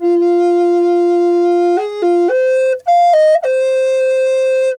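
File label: flute, 100 BPM; Didgeridoo and Other Primitive Instruments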